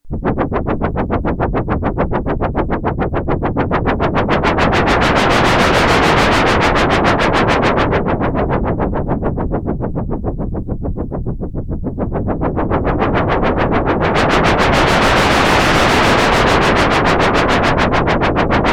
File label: STEAM LOCOMOTIVE, Roland SH-101; Factory Patches